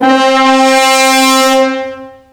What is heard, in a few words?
Horn; WoWInterface; Details